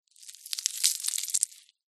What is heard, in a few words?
crab shell remove rip; zapsplat